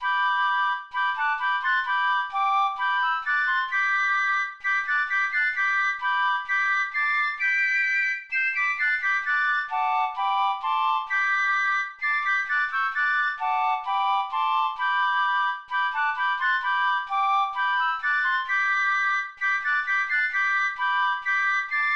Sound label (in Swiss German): hochzytsmarsch; 4. Stimm; Loose – abelaade